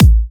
Electro Kicks

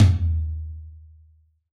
rock tom, Yamaha DJX Rock Kit

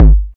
bassdrum; Ueberschall; Jam Box

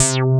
BASS, JV-1080

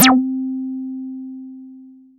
Synths BASS

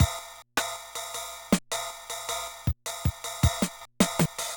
Loop, classic machines, rpm 40

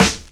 SD, dance drums hip

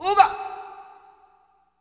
MALE CRY, Heart of Africa; Spectrasonics; Heart of Africa 2; S.MALEAMB

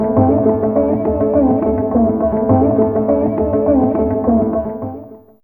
Sitar Play A3, Fairlight CMI IIe; Effects3

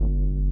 LIQUITAR; Spectrasonics; Metamorphosis

